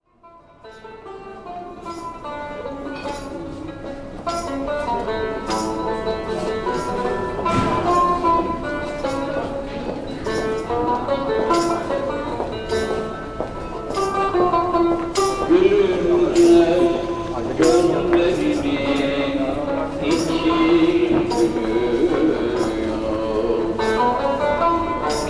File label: cümbüş vox Taksim Metro